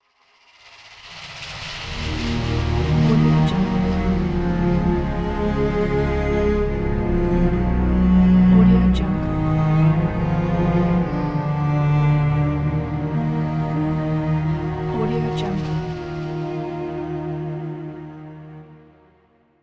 dramatic orchestral cello ident

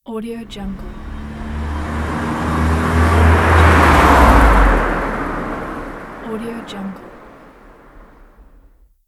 car passing sound

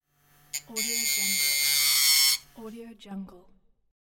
angle sander

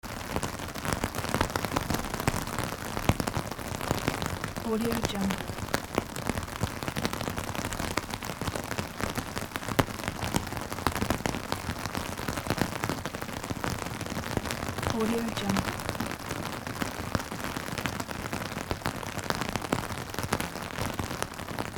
rain on umbrella

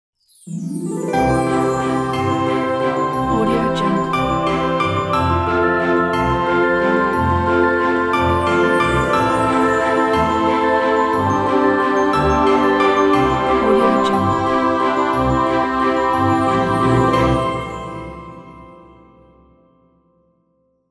christmas dreamy waltz ident

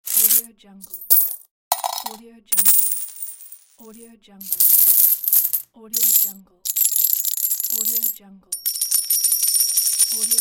coin pack

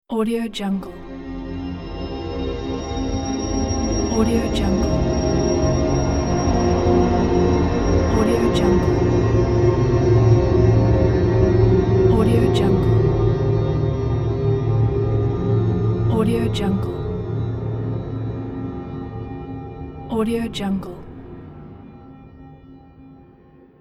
world of ancient magic background transition

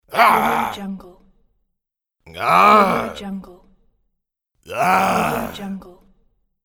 man roar

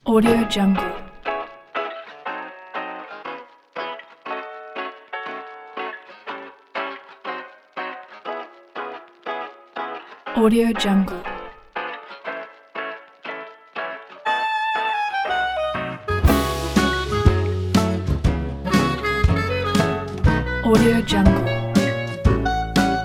dixieland jazz

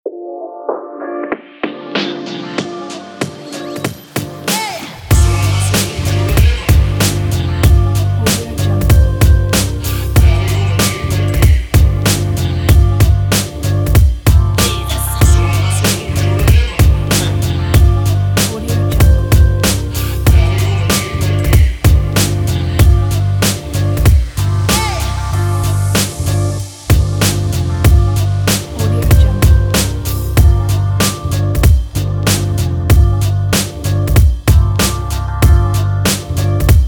hiphop

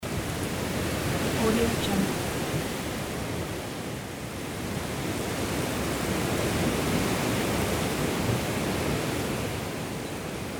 sea

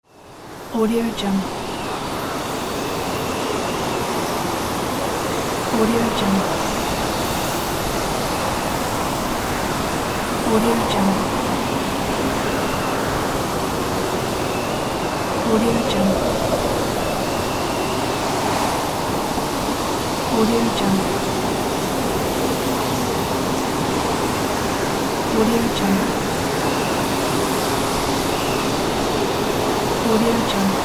wind

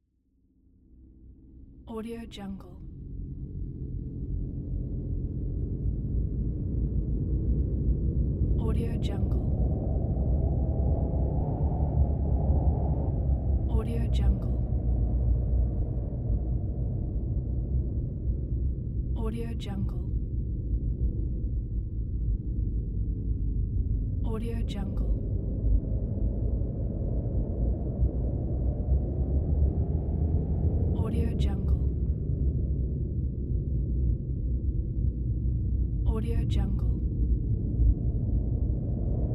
ambiance

